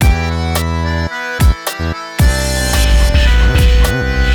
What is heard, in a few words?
ringtone, hiphop rnb; Google; NewWave Labs